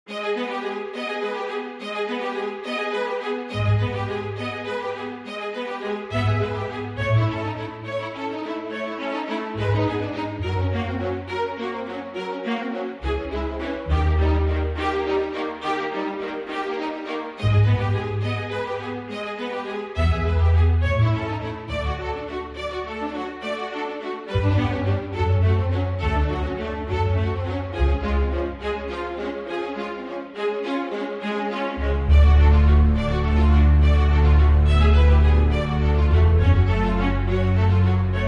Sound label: String Underscore